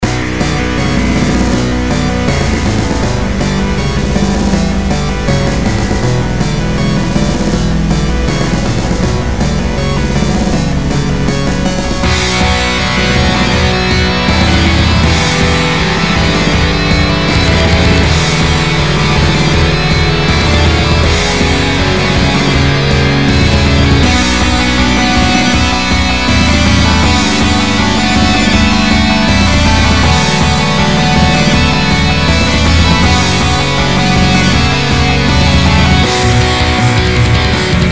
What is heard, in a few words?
Metal; ATOM SWITCH, INC.